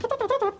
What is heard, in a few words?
noise; cosmo kramer; season 9 bloopers